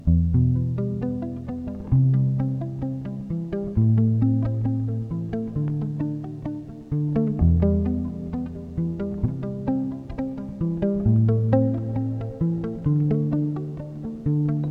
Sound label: Riff